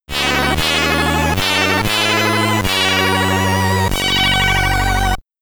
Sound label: Jingle (SID Stereo); Championship Run